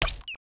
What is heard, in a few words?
dog walk